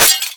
glass impact, Bullet Impact; Dystopia; physics

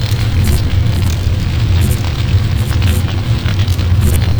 transformer fire loop; Dystopia; cybernetic

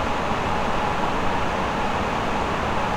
ventilation; assemble

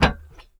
metal grate, footsteps; player; Dystopia

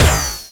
lrshot, weapons; Dystopia

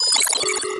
call vote, ui; Dystopia

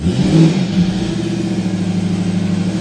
rev, vehicles; Dystopia; brute

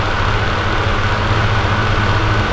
fan roof loop

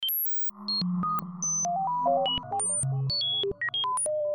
cyber Scape, cyberspace; Dystopia

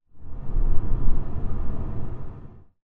tunnel pass; Dystopia; fortress

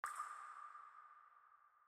drips, injection; Dystopia

